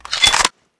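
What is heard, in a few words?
rpg reload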